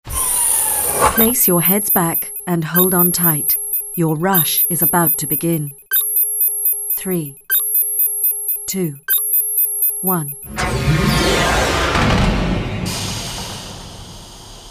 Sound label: Ride Start